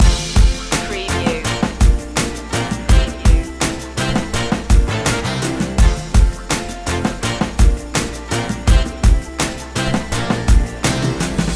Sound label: spring steppin loop